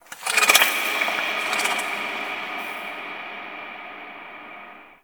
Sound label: Under City Small Creature